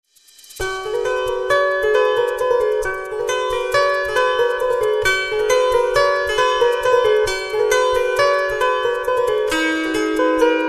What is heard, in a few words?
Gusli